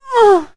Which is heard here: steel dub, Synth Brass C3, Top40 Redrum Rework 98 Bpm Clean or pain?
pain